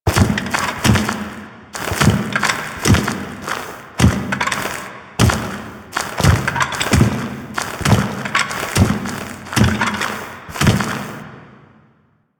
Foley; Ballas; Warframe; The New War; Fight Intro

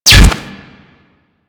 Bolt Impact Metal; Warframe; Weapons; Cross Bolt

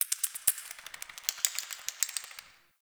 Cave Shrine Critter, ambience; Midrath Island

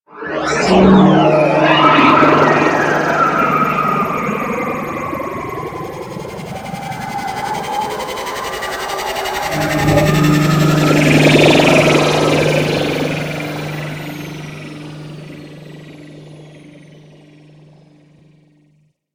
Insect Liset Relay Docking, Vehicles Flight

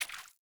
Fawn Footstep Water, Foley; Animals; Ambient

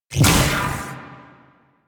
Shot; Warframe; Quests; The New War; Weapons; Omicrus

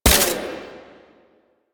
Fulmin Prime Dry Fire, Weapons; Warframe

